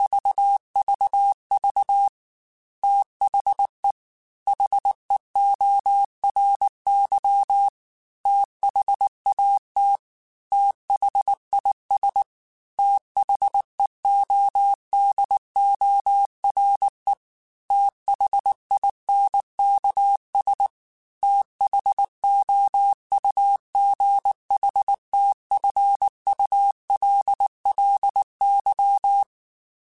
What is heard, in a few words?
TH Runs, Morse Code; HamRadio; Prefixes